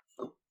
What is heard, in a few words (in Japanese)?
ティーカップを取る音